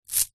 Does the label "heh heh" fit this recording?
No